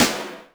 snaredrum, Yamaha RM 50

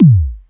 Bassdrum, Roland System-100; Drum Samples

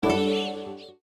Tranquil slide open; Nokia Ringtones; Audio themes

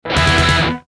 Hard rock instant message; Nokia Ringtones; Audio themes

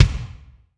Kik Hall, Bass Drum Kicks

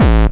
bombo, kicks, Hardcore

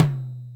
Yamaha RM 50 Toms

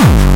bombo, kicks, Hardcore

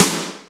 Snare, Yamaha RY-30 SNARES

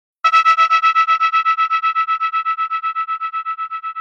Mood Alert, Alerttones; Nokia Ringtones; 8800 Arte

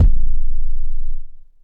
kicks, Bass Drum

